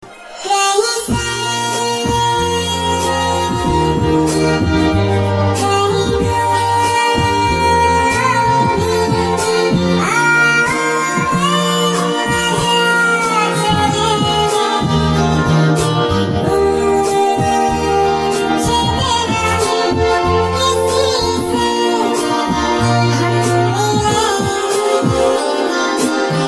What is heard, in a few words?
Chipmunk